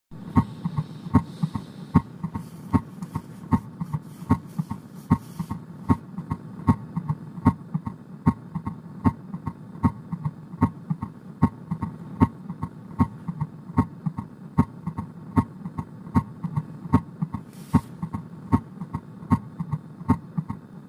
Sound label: S3 Galop; simulatorvzorky